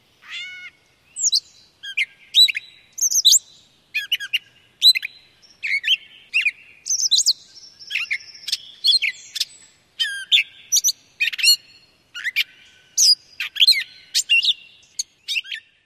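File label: Gray Catbird song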